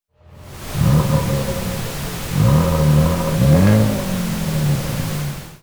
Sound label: Rev pink